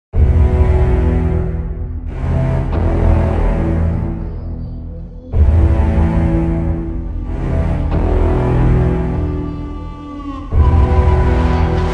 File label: No Vocals, Epic Action & Adventure